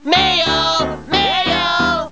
mail pip

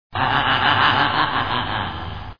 haha; geraeusche menschl

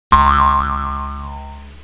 comic spring boing; geraeusche virtuell

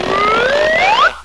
zlide up; geraeusche virtuell

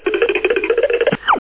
comic; runescape; geraeusche virtuell

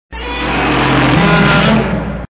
godzilla; geraeusche tiere

